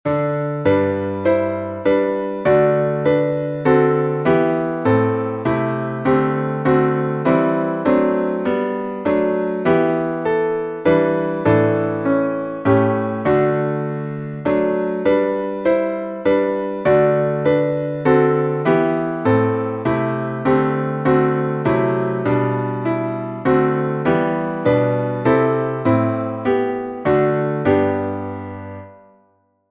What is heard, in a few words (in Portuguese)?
salmo instrumental